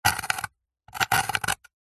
wood short scrape carve scratch with small sharp metal spike; foley